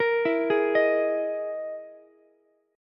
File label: E Major 7th Flat 5th, Ukulele; EM7b5 arpeggiated; JGuitar